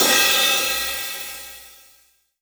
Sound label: DRY, 18-22 CRASH